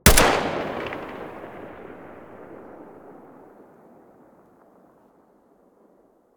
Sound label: galil muzzle mono